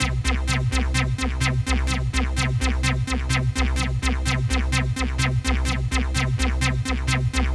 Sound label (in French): synthe acid long part